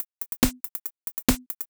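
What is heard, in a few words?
DRUMLOOP ELECTRO 140; Techno2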